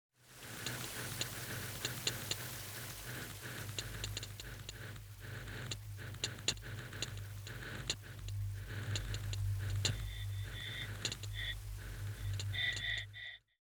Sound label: time clicks fire